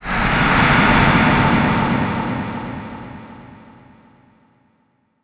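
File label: Distant Abstract Scream Short, monster sounds; The Decline Of Warriors; Monster Sounds Volume II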